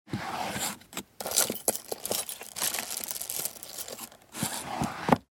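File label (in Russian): шкатулка с драгоценностями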